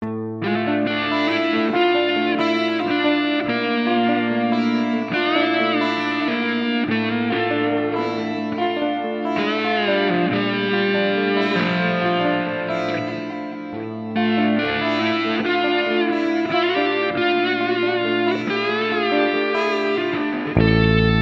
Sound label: Loop Anime Dm 70, anime slow guitar ballad loop 70 bpm